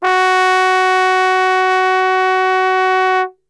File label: F#2 Brass Super Section; Best Service; TRMBSTRGHT; 90 s Sample CDs